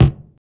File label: BD, Hip Hop R n B; USB Soundscan vol.19; AKAI